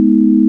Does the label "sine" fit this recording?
Yes